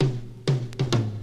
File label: break down, Drum loops, Rolls